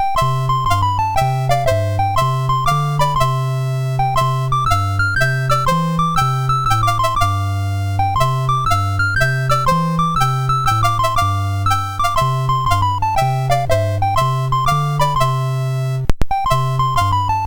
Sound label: sid MOS6581R2